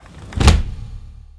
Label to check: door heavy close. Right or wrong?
Right